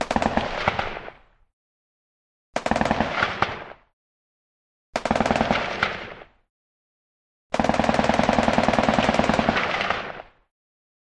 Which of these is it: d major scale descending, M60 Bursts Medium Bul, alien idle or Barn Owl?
M60 Bursts Medium Bul